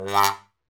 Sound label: BRS BONEWA, Solo Tb mute; Roland L-CD702; Solo T.Bone